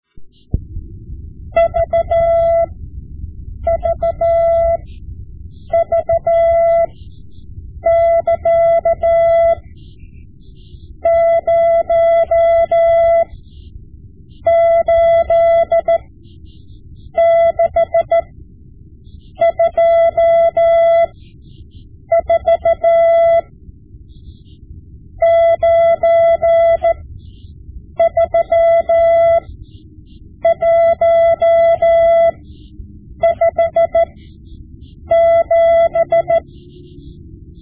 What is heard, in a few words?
Morse Lessons